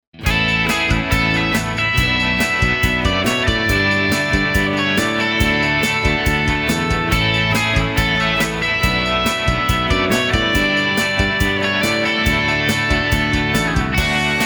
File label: rock